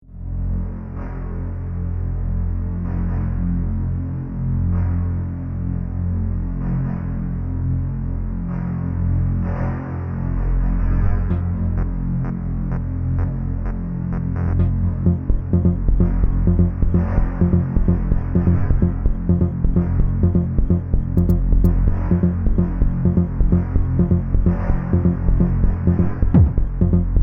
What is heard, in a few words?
Noise